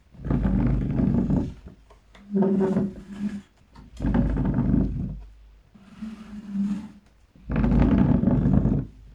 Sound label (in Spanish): Silla arrastrada